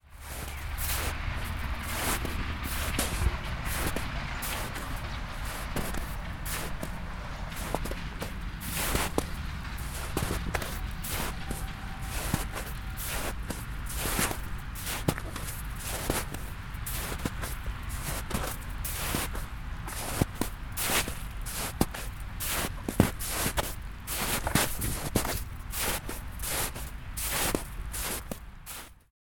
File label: snow